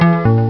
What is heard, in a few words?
Bell Med